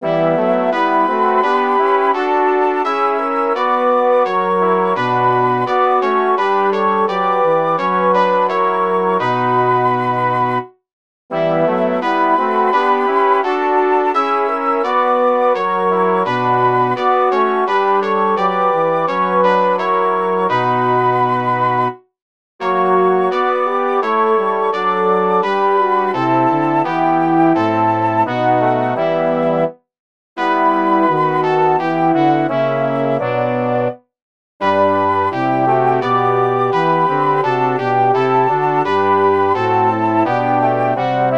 chorale for wind quintet; bach johann sebastian; ist das heil und selig licht

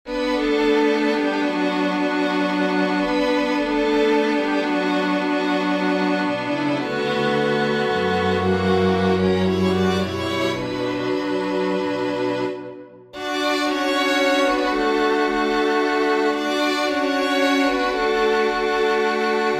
for string quartet; grieg edvard; the wanderer from lyric pieces

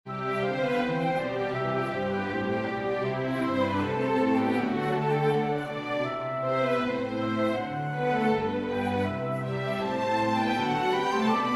for winds strings; purcell henry; come come away

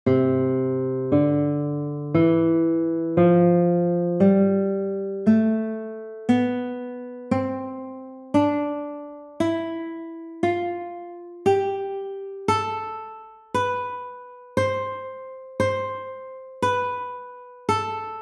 major scale

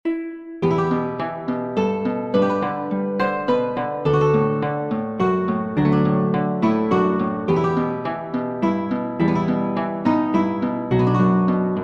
Greensleeves for guitar harp; what child this

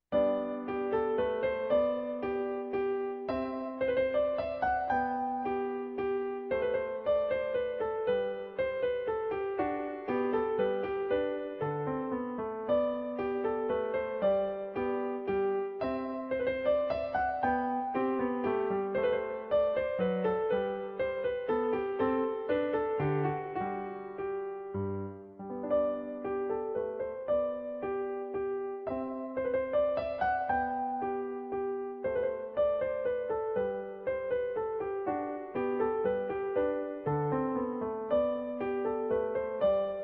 menuet major; Johann Sebastian Bach

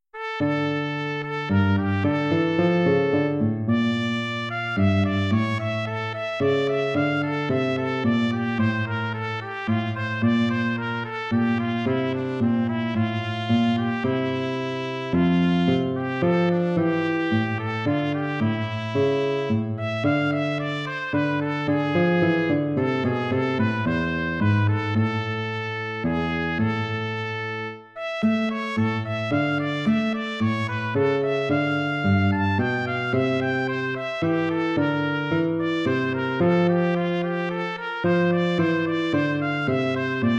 courante

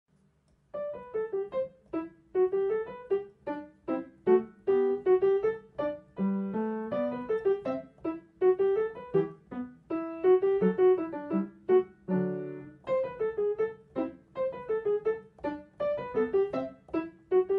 gavotte; dunhill thomas

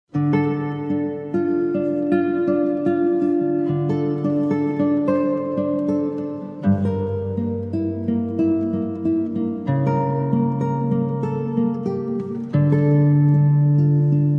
classical guitar; road dream

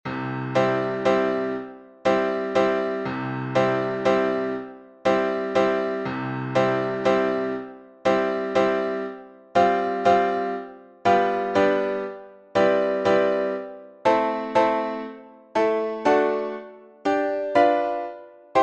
the elephant for viola piano; saint saens camille; from carnival the animals

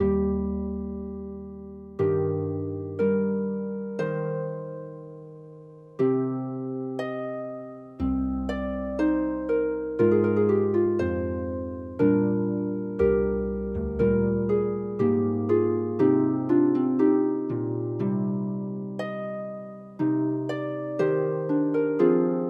for harp; colebault jacques; o vos omnes

